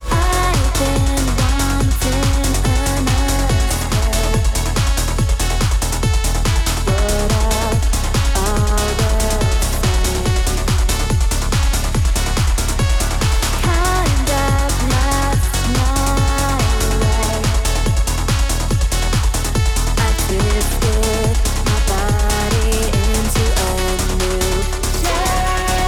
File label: Rave Remix